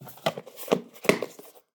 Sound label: VHS Handling; SoundsCrate